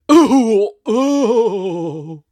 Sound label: Maggot Death; Hollow Knight (gamerip) (2018)